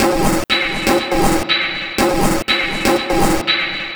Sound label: Percussive Break 121, Lounge Drumz